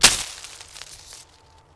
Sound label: bush; Mafie 1